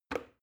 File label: plastic button start push regular